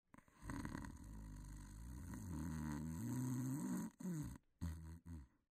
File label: old man snore; Fruity Burst 2